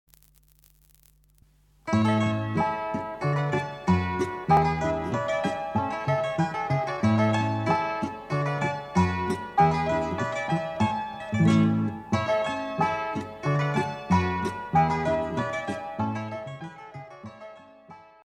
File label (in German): boarischer; fuchsanger